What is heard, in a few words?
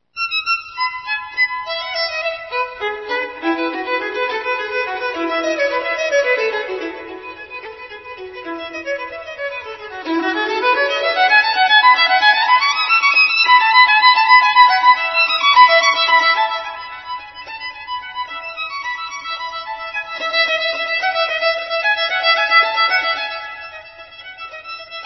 Classical, In Store Music